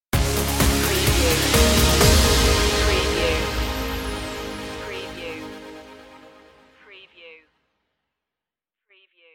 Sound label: pass me by stinger